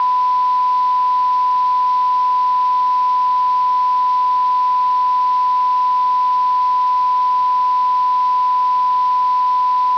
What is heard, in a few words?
DVI 1kHz 12.00dbFS